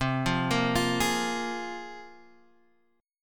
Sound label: C 7th Suspended 4th Sharp 5th, C